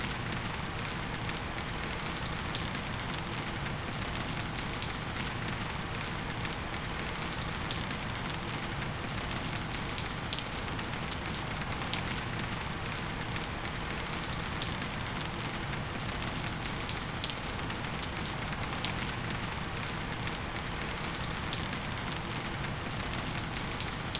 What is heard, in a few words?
Rain Sounds, Relaxing Sounds to Help You Sleep